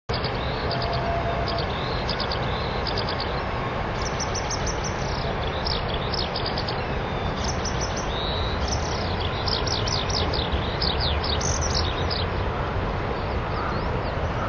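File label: hibari, skylark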